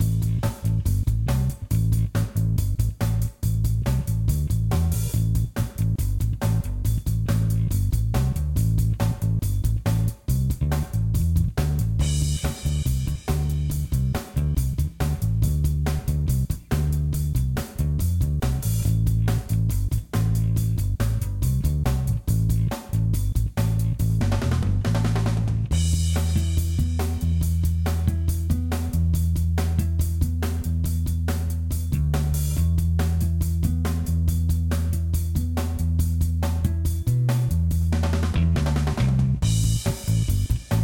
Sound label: music; bouncy golf